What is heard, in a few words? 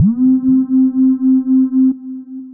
Climb Chord Pad; Synth Pad; Ableton; Chop and Swing